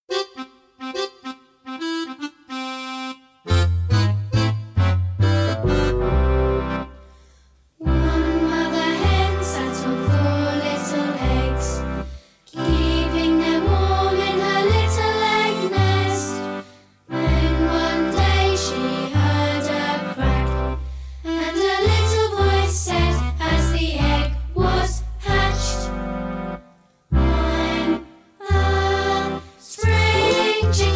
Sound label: Chicken song